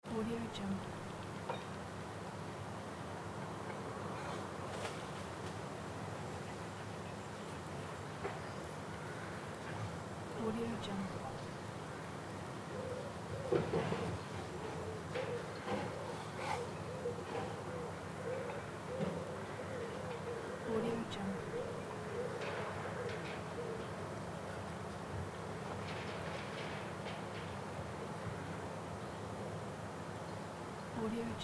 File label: scottish summer garden ambience with workmen and traffic